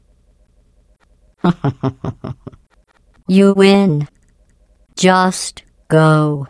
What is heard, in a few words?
escape